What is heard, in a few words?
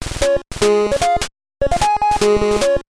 Reinforcement Jingle; North & South; NES